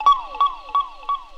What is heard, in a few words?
fx c#, mixedfx; house; PS-28 Vocal House Samples